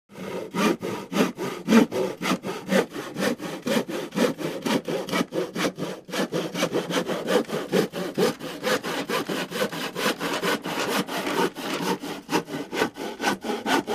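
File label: Hand Saw Steady Cutting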